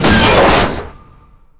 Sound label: rocket